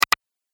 Double Click Sound; object sound effects

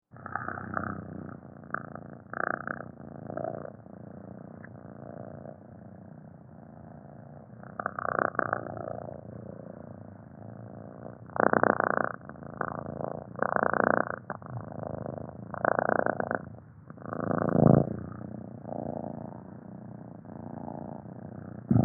Cat Purring Long Deep; Cats; Sound Effects:Animals

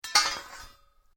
METAL OBJECT CRASH; Metal Sound Effects; Metal (Sound Effects:Materials)

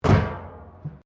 METAL BIN IMPACT PLASTIC CRASH BARRIER

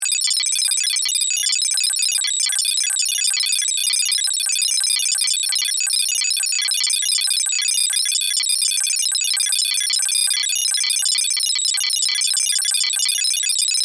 Data Readout Beeps, Scifi; Blast Wave

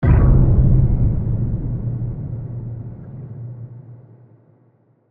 Hit Complex Water; Hit Sound Effects; Sound Design; BlastWave

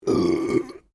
Burp female medium, Human Burping

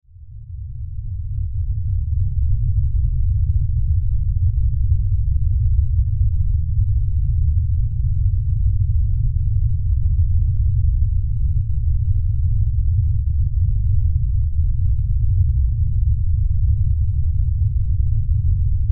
Impact Earthquake Disaster Lfe Rumble Low Bass; Blastwave

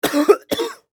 Human Male Cough; Sick Sound Effects; Sick (Sound Effects:Human)

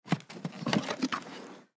Suitcase Open; Latch Sound Effects; Objects; Foley

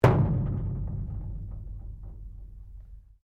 METAL IMPACT ECHOE; Metal Sound Effects; Metal (Sound Effects:Materials)

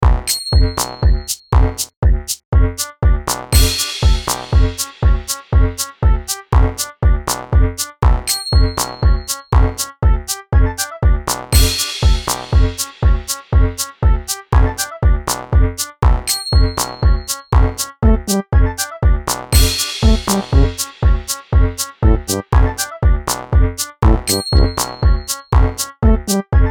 BEAT QUIRKY, Beat Loop Quirky Fun Cartoon Circus

